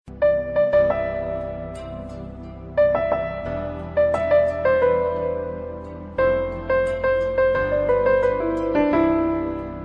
Msg Cute Music

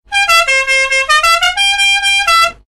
Redneck Horn

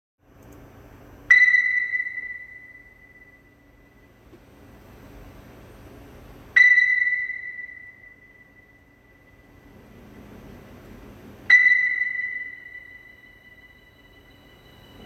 Ping

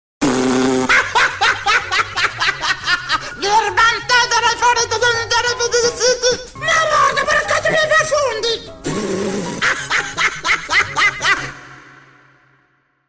Funny Penguin Laugh

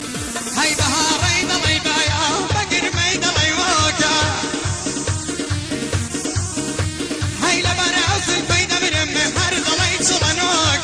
Kurdi

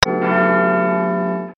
Big Ben

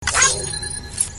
Thumbs up ping

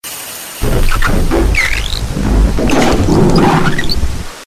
Glitch

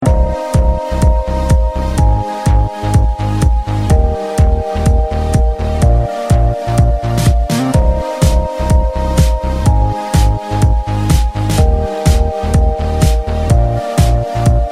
Sweet Tone

